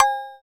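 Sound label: PER PROCU, Procussion, percussion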